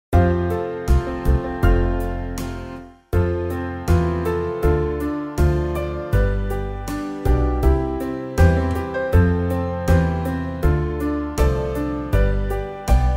karaoke

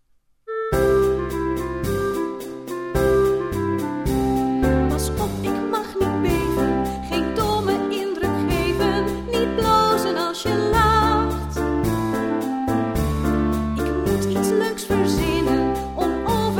Niemand mag het weten meezingversie

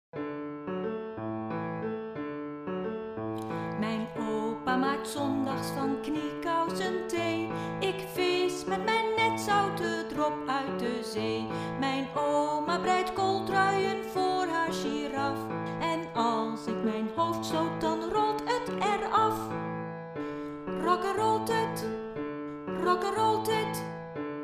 piano en vierstemmig ingezongen

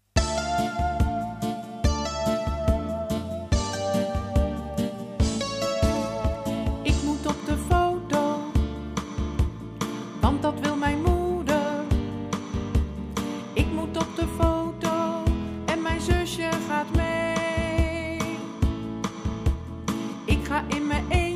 Lied van de maand ingezongen versie

